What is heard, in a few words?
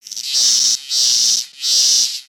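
Pets Insect Large Beg; creatures; Lego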